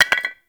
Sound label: Clay Pot Hit Drop; Gameplay; Awakening Remaster